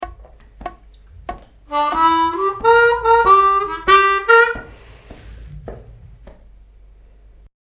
Fun Shuffle Licks Lick, harmonica

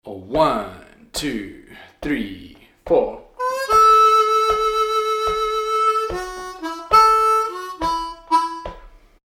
Turnaround, harmonica

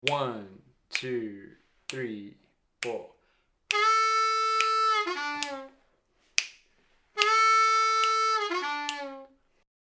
Lick Slow, harmonica

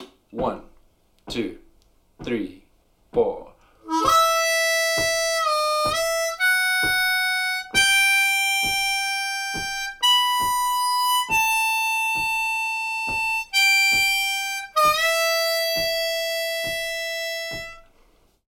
Slow Lick, harmonica

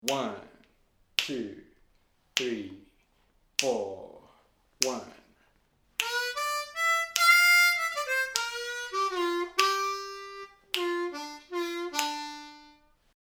Turnaround Slow, harmonica

